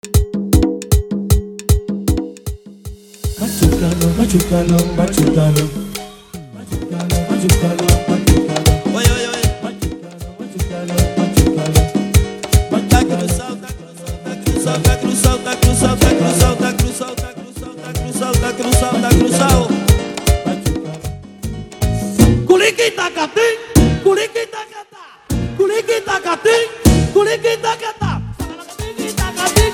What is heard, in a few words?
intro extended 155bpm; Latin music remixes